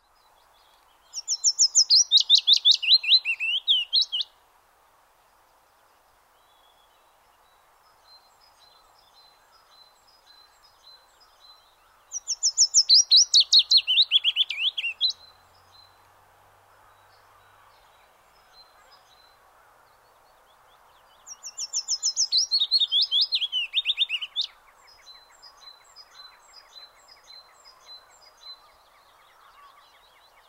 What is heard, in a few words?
willow warbler